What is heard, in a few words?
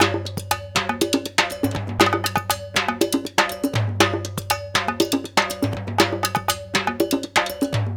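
120 bpm, Latin; Spectrasonics; Supreme Beats; African Contemporary; Latin MM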